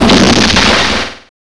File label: cannon; gunslinger